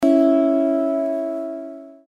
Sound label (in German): Zithermacher Ton C LAUTER